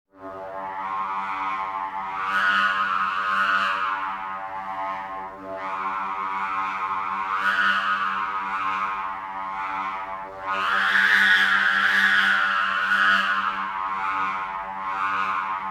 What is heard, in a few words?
sfx spiritcatcher G; best service Blue Box